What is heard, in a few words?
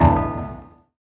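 guitar (atonal bridge); Single Shots; Misc; Sonic Foundry (Sony Creative Software); Ugly Remnants VOL-2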